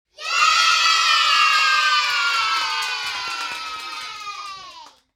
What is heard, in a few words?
children yay